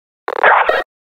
hit; combine

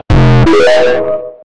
tiktok earrape

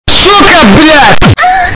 ahhhh; suchard

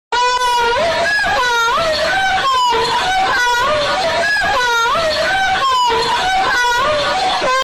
uuaahhhhhh

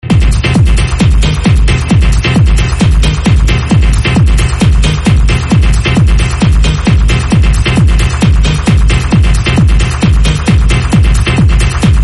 rave

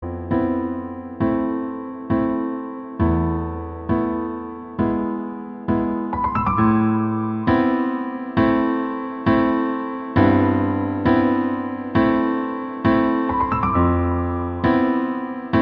sad song; bleach

